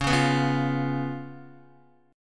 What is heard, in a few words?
Db 7th Db down Guitar; Db7 strummed; JGuitar